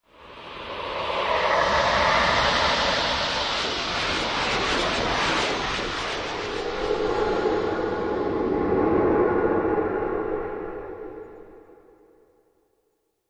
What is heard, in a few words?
Sting Haunted Wind; Brand X Music; Brand X Music Catalogue - Editor's Essentials